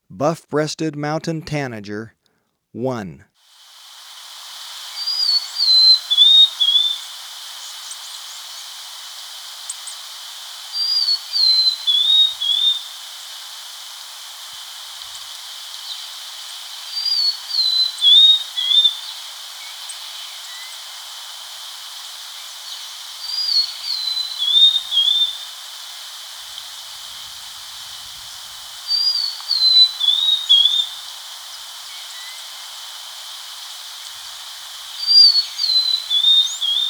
Buff-Breasted Mountain-Tanager, Birds of Ecuador; S. America

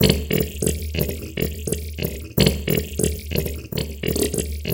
Squelches; Electronica

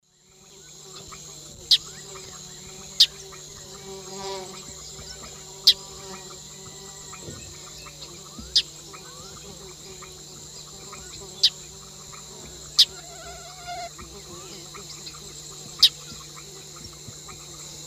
Agelasticus xanthophthalmus, calls; S. America; Eastern Lowlands

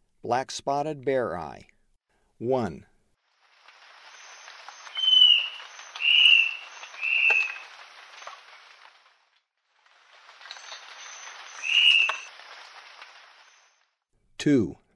Black-spotted Bare-eye; S. America; Eastern Lowlands

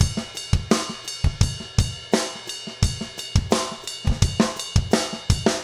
rock 85

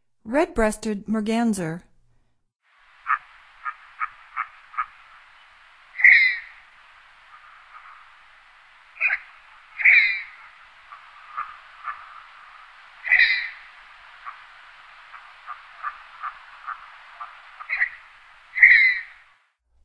Red breasted Merganser; North America; Stokes

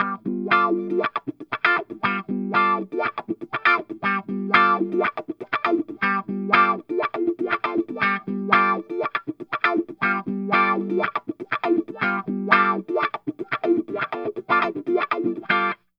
Guitar, Funk; G9

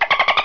DOLPHIN, animal; 动物

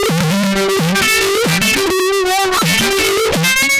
Hyperfuzz, Guitars

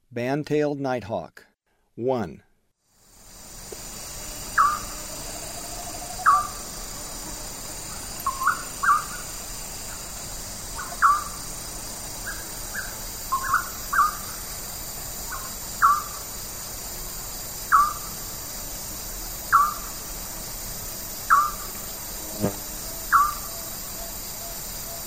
Band-tailed Nighthawk; S. America; Eastern Lowlands

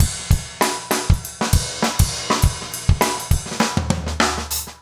basic funk 100

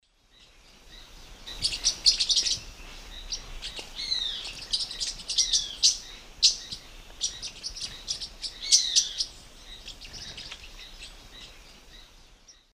Forpus xanthopterygius, flight calls; S. America; Eastern Lowlands

